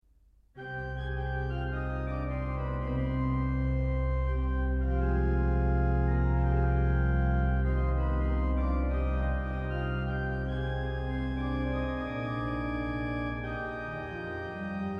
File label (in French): fond orgue; Boyvin